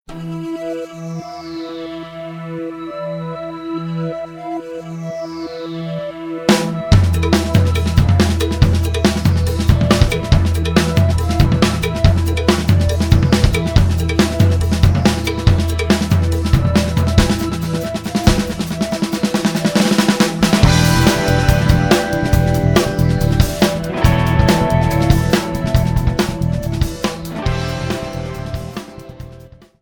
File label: pulse